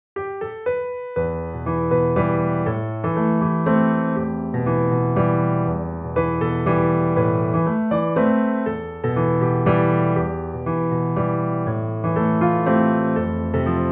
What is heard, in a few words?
piano, sadeh